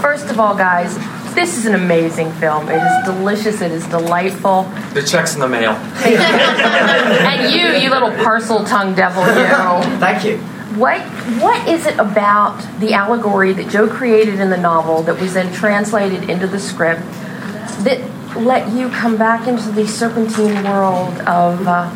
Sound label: Horns